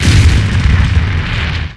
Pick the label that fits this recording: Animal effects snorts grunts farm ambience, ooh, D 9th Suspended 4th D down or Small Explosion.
Small Explosion